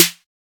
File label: normal hit whistle